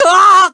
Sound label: "ah", pain; ooh ahh